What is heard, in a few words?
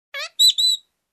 Fowl Chirp